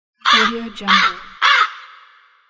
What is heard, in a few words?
crow; anderington